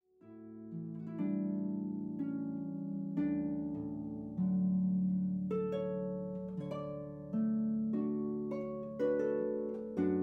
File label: harp